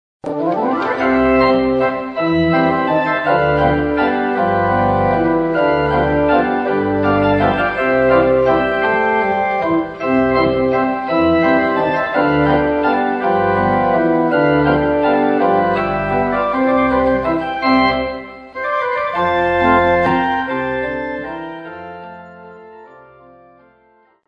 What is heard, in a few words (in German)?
Walzer